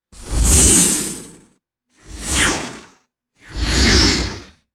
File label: flanged sci fi energy whoosh pass by